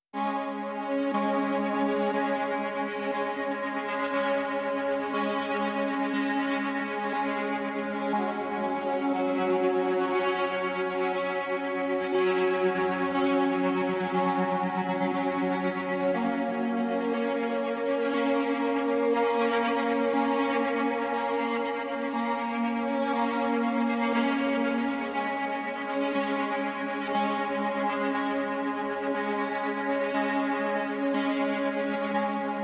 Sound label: Strings